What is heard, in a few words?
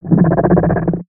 Snore In